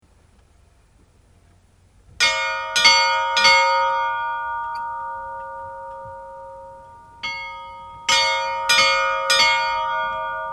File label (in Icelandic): reyniskirkja badar, kirkjuklukkur